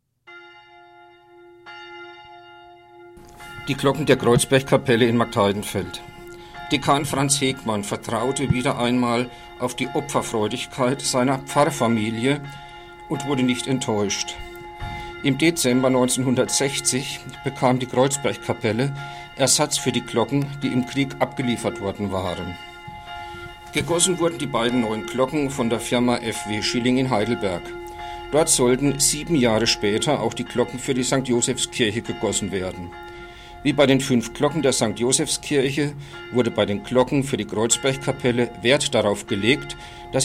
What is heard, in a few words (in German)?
kreuzberg glocken